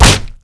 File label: knife hit flesh